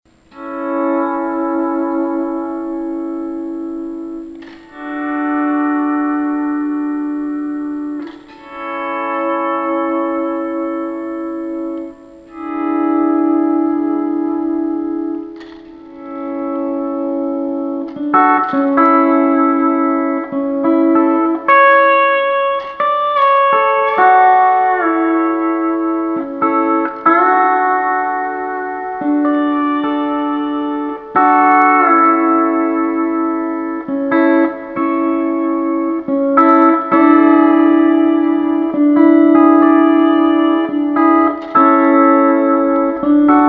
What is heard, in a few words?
pedal